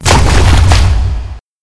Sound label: hit water